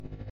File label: buzz